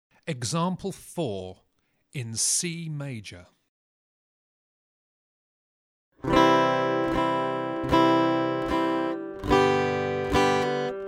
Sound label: Aural Harmony Test, acoustic guitar; Grade 4